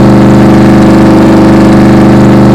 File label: dodge 71 onlow